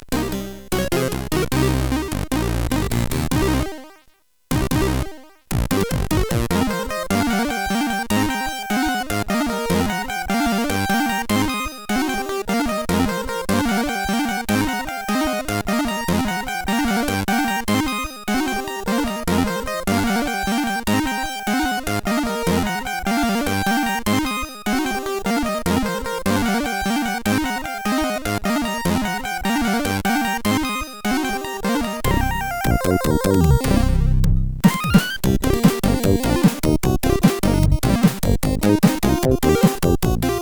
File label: Universal, CSG8580R5